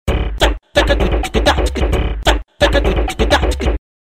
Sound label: beat, Base De Funk; Base De Funk 29